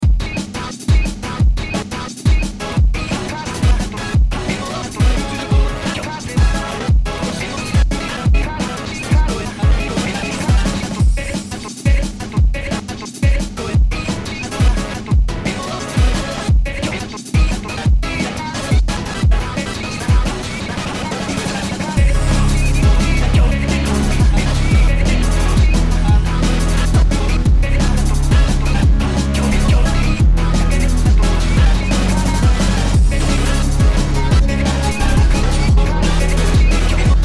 drum and bass remix

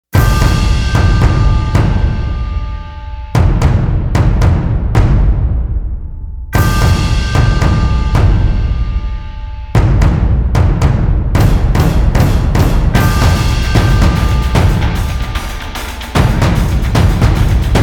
drums

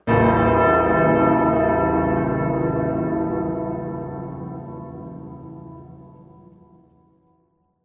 bell deep hollow

